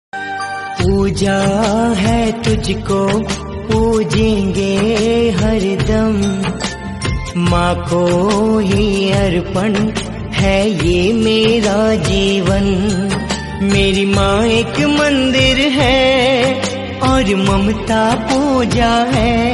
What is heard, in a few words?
hindi